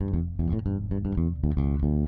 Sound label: Electric Bass; Basses